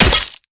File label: Hit Light Ice Add; netent